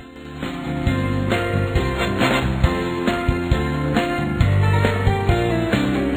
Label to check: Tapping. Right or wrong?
Wrong